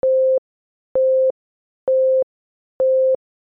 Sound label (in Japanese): エンベロープ サンプル